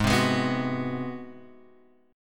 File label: G sharp 7th Sharp 9th